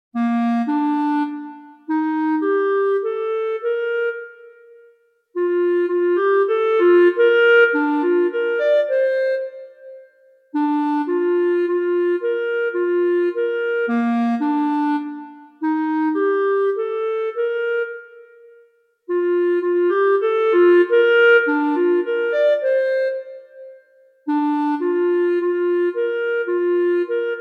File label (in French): sonatine majeur pour flute hautbois clarinette; cordoba jose ramon